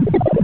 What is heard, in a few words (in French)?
Glou Glou; Balderdoush 1.04i